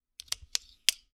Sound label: Part Assembly; TOOLKIT vol.2